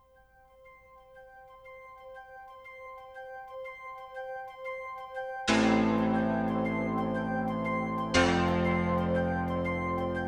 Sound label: Eerie Forests; Shadow of the Beast; PC Engine CD